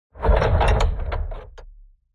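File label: Door open close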